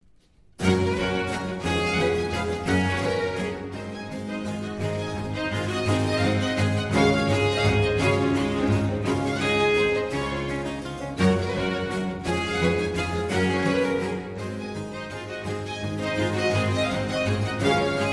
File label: Concerto for Strings in G Major, Presto; RV 145; Vivaldi